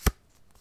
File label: card Slide, Casino; Kenney's Sound Pack